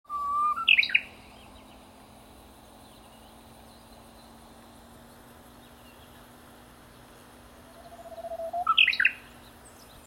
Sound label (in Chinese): 遠東樹鶯